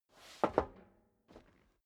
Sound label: step dress boots metal rattle scuff; schritte; Footsteps; menschen